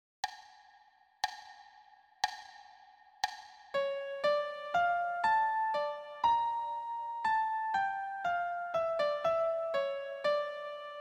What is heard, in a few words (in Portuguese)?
Re m piano